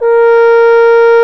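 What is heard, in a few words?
brass, Trombone; Roland L-CDX-03 Disk 2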